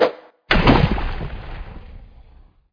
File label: volcano splash